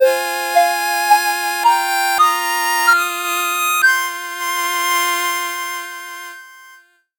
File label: 8bit jingle slow